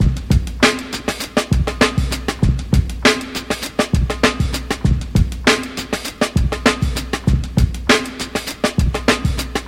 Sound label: Drum Loops